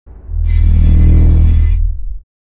Body Scan Heavy Synt

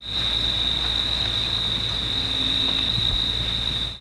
Cicada Night Backgro; cicadas

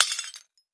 Tile Debris Hit with Hammer

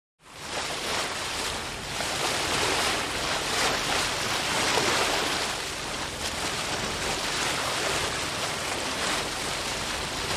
Bow Wash Very Close

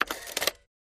Calculator Sharp Cs, adding machine

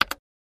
Calculator Sharp Cs, adding machine

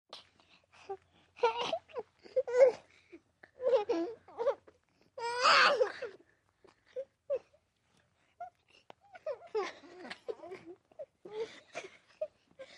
Little Girl Tickled

